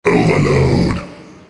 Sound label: overload; war3source